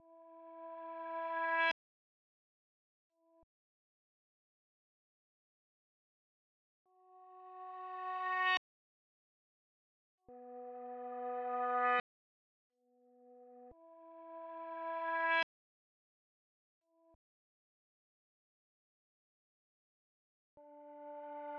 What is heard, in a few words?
140 BPM E Min Swell; Cymatics; Destiny Gold Expansion; Chardonnay